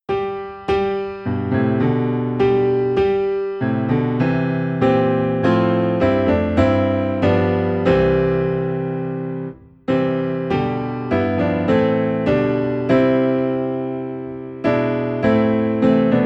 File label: Piano Low in C, hymns; Rejoice Ye Pure In Heart